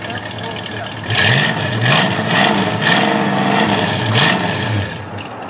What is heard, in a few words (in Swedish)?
Motorljud Mustang